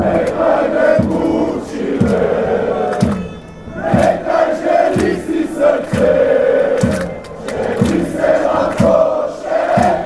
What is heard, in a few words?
slovenia chant